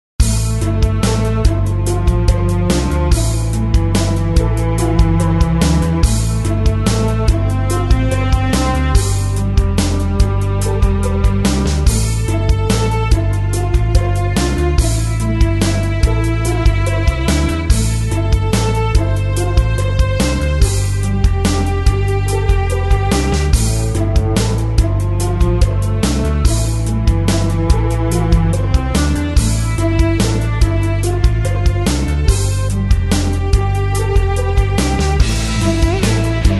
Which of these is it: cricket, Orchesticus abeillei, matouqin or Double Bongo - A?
matouqin